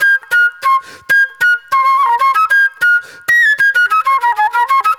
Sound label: NY 70's Jazz Flute; Sonic Foundry (Sony Creative Software); Manhattan Flutes; Session 01 - Benedetta